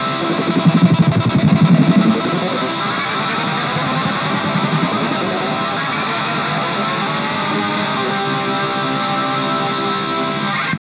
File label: tap hound; Spectrasonic Distorted Reality 1